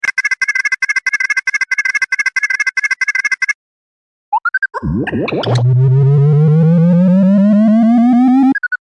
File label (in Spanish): Sonido carga futurista; tecnologia; futuristas